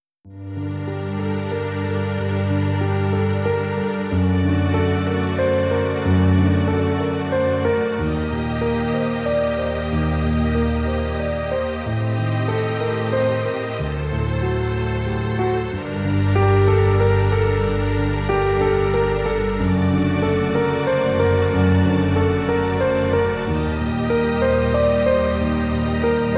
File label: dramatic epic; By Burak Sound Bank